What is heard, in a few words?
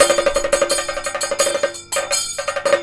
KATAK, Indian Traditions; USB Soundscan vol.45; AKAI